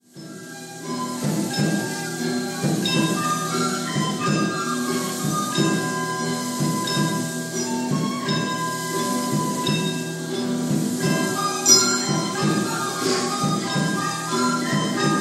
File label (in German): Instrumental kurz; Verwandlung